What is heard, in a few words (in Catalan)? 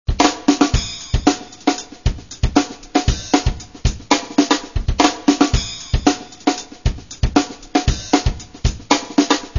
bateria